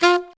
F Sax; Notes; Scratch

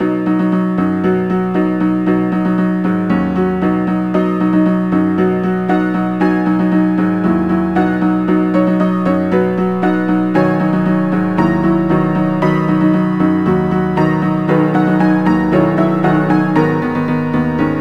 Dance Rumba Fast; knigge spielend zum guten benehmen; nds

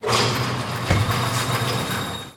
Crane Pick Box

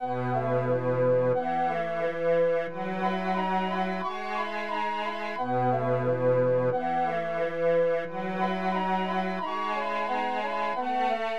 Conversation

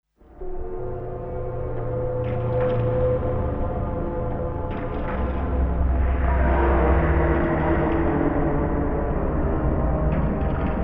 Crazy Laugh; Siren 2

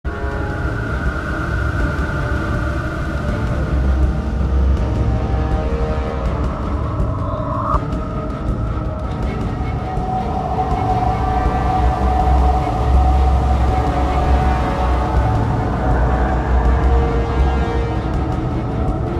AMBIENT; F.E.A.R. Perseus Mandate

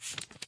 Paper Turn; Lethal Company; Windows

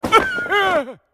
Character Mage Hit; Angry Birds Epic

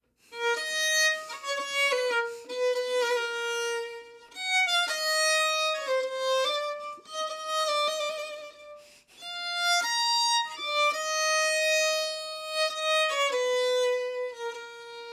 Violin; Assassin's Creed Syndicate

